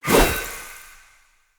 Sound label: Dagger Throw Perfect; Weapons; Player; Swords; Fey